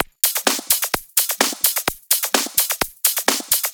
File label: Houseloops 128BPM; Vengeance Samples